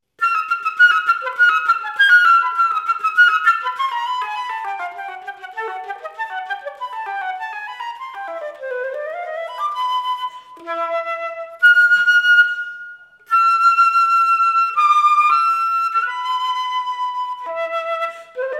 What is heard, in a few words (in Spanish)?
FLAUTA